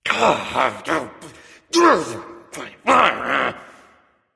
grumbling; smite; voicepack; ullr

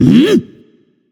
notice; danzaburou

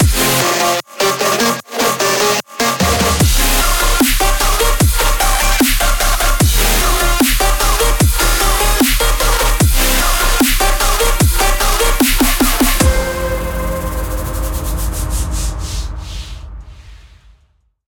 mus playerkill; Smite

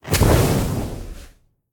sword flame; smite; gilgamesh

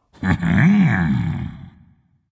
content dragon; fafnir

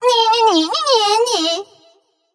tongue light; smite; voicepack; hel

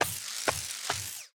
idle scratch; smite; kuzenbo

